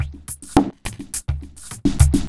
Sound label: xtra Euro gruv; Sonic Foundry (Sony Creative Software); Ugly Remnants VOL-2